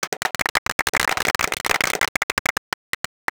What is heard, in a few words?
clap, Crowd German